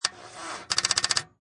Car Dead Battery, Car, attempt to start, battery dead with clicks; Cars; Vehicles